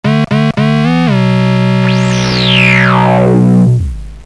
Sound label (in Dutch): Einde rit tune